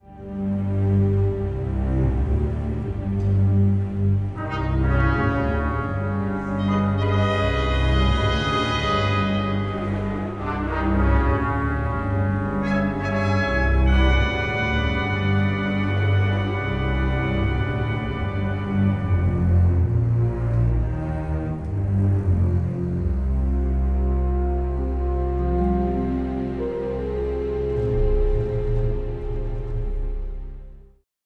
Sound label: Suspense; Star Wars Obi-Wan